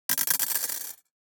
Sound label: DICE on Glass, Throw and Roll, Mini, 6 Six Dice; Samples; ArticulatedSounds; DICE Sfx Party (Extended)